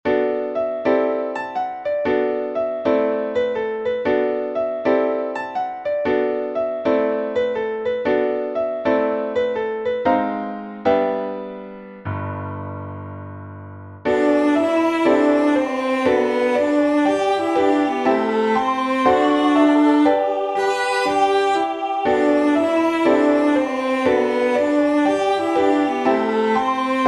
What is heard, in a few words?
raduj se a vesel jazz G dur